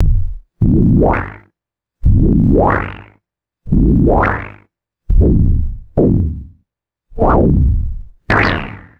retro anime question mark synth bloops; cartoon

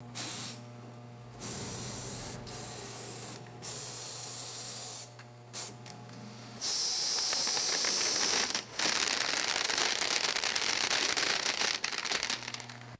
thin compressed air hiss and rattling foil

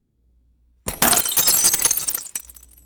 thin glass light cover shattering

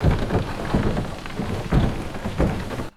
heavy wooden ship creaks and rigging rustle; boats

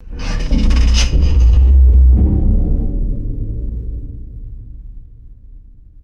loud resonant metallic scrape and vibration

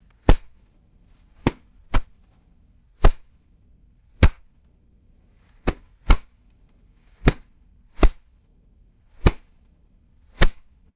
leather baseball glove slapping canvas base; sports

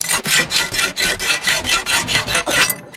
rapid abrasive metal filing scrapes

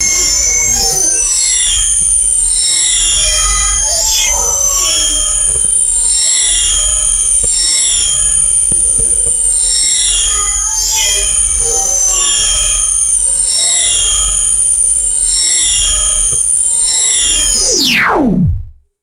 retro glassy laser beam blasts; lasers

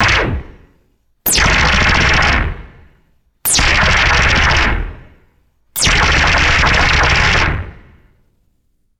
rapid retro sci fi laser bursts; lasers

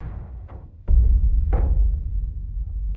deep muffled underwater concrete impacts

